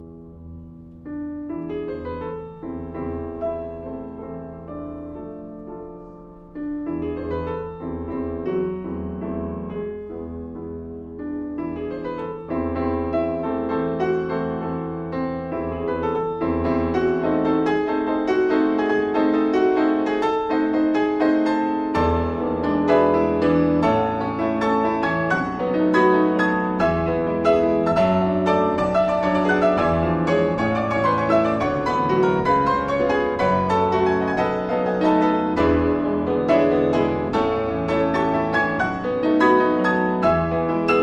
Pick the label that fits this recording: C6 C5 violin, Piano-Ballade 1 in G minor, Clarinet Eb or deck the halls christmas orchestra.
Piano-Ballade 1 in G minor